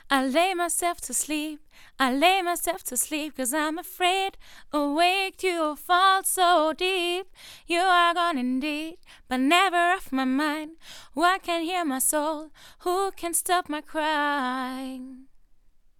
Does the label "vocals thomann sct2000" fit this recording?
Yes